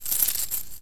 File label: take gold